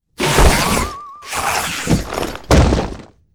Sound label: miner deth; monster